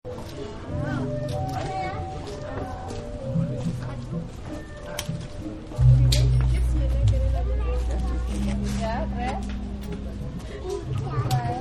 Bali Market Amb; Cities; Backgrounds

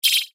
Beep Tone Signal Multimedia; Web Interactive

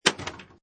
Pinball Sound Ball Launched; Video Games

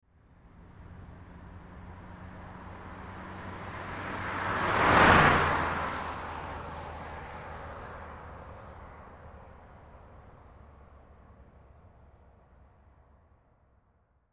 Ford Escort 1986 Drive By Right To Left 55 Mph; Transportation Automobile; Car

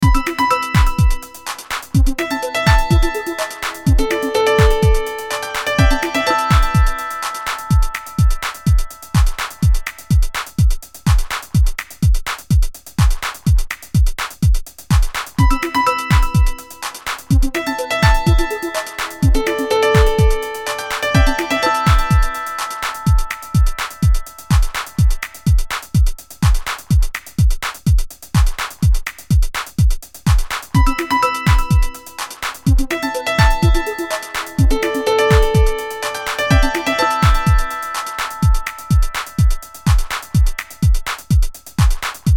BEAT PIANO, Beat Piano Techno Rhythm; Miscellaneous Loops; Blastwave

